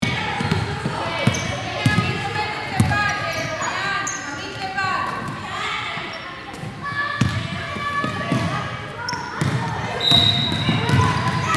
Gymnasium ambience students playing basketball loop